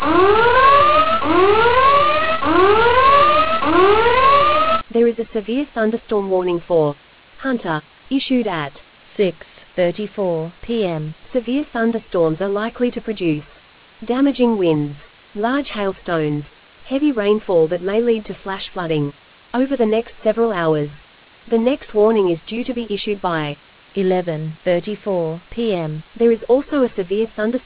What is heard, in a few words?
storm audio